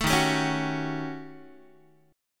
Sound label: D sharp 7th Sharp 9th Flat 5th Guitar; strummed; JGuitar